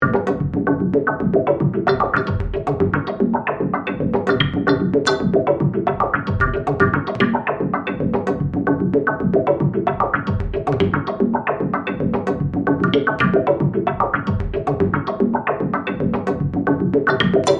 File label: Bed Arpeggio, Production, Element, Accents, Loop; Beds